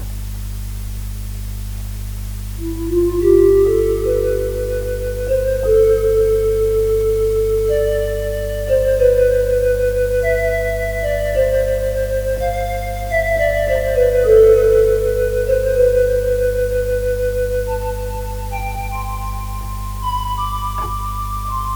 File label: sad flute